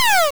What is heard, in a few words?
Enemy Laser